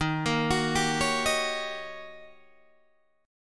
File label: Eb 7th Sharp 9th, Eb, Guitar, Open D